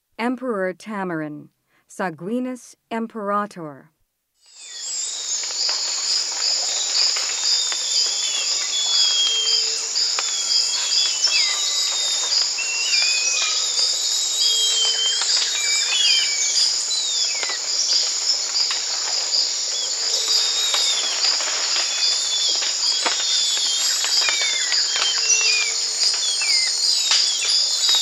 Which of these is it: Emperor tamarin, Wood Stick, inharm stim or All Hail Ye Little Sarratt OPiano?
Emperor tamarin